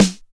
Short Acoustic Snare G Key, steel snare drum sound tuned to the G note